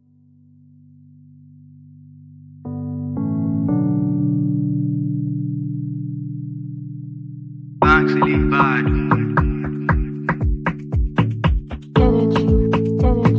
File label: instrumental